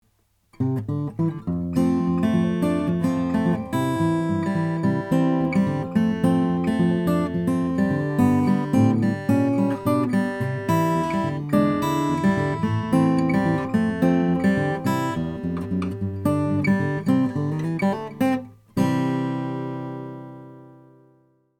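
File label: bruce sexauer 0 12 brazilian rosewood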